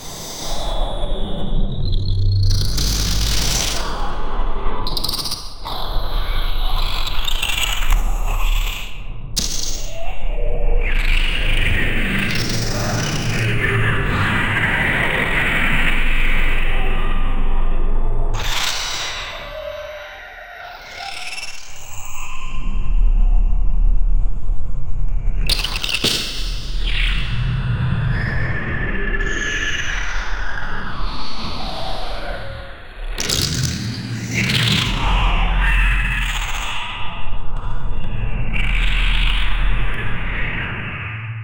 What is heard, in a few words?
alien lockdown sound effe, buzz